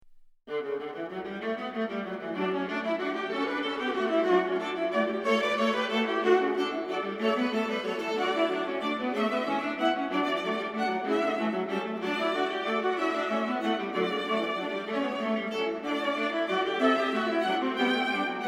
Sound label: Violas; Doodles; Violas A: (Brandenberg)